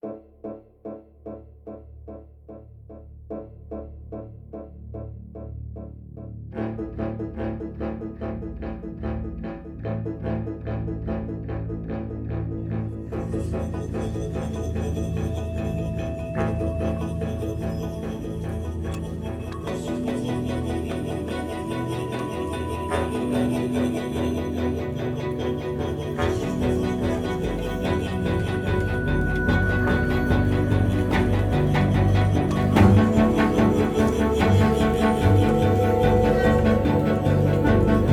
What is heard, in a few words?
loop Demons; the walking dead michonne 1 in too deep